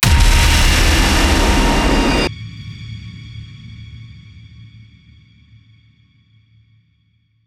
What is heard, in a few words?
Tension Builder Impact